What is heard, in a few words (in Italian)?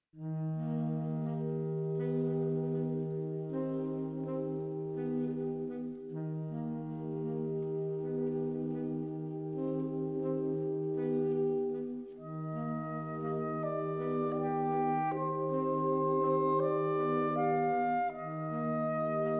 quartetto II excerpt